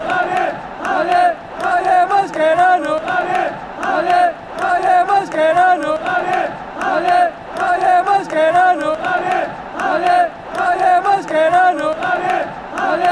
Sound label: ale, chants; mascherano